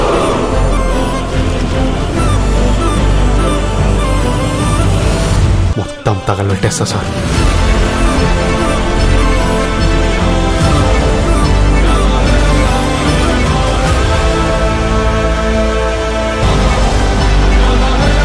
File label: bgm; kingdom movie